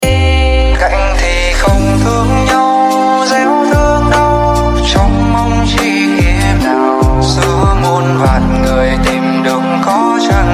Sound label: Lofi